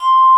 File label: BASSVOX; Masterbits; Vintage Voltage; SQ+FX